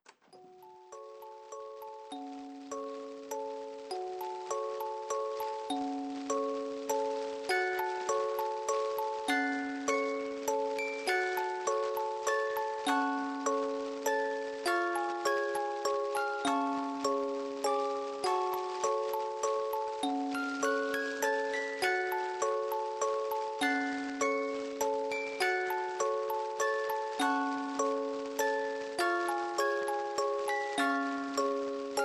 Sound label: Music Box; Seiga